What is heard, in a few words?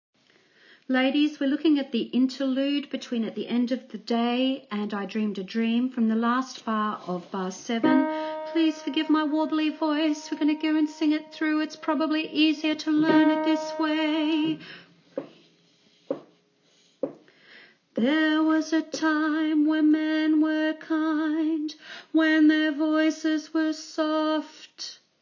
interlude, altos; Les Misérables